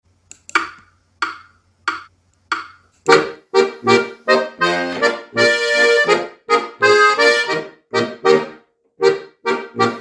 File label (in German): Boarischer